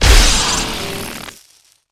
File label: blast; Player FX